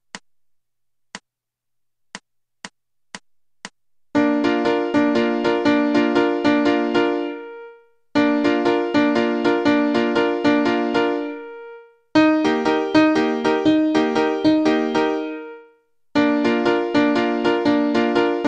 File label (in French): basse